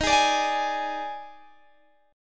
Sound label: Eb Major 7th Suspended 4th, Guitar Open G; x,20,18,19,21,18; down